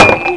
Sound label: Push Object; Casady & Greene; Crystal Crazy Demo; Smash the objects